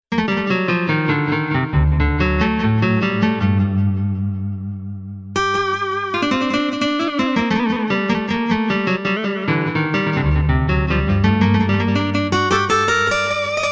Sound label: guitar